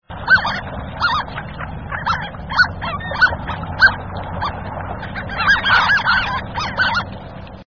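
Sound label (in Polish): kamieniuszka, głosy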